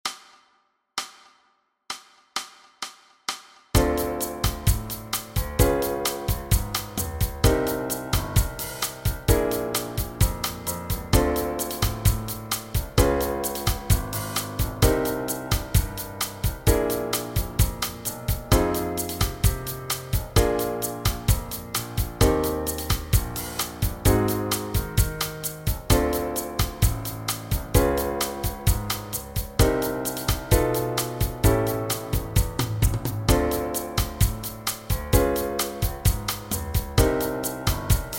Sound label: Backing Track, walking bass